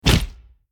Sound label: Bounce Large